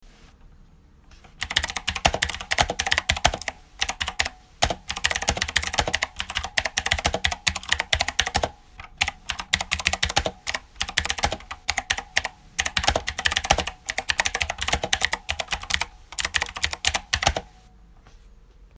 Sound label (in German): Elephone tastatur